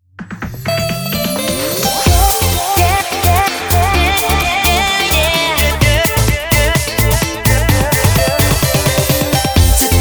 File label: Hot 128bpm Main ID, jingle; Warm 98